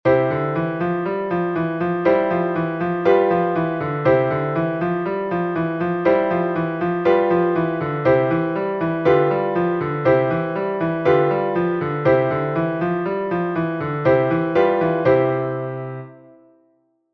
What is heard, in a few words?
Czerny Style Eighth Notes, Piano Warm Ups